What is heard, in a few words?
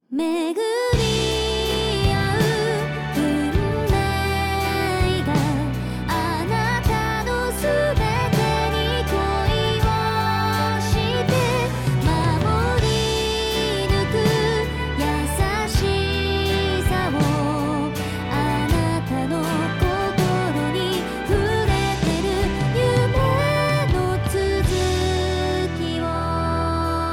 song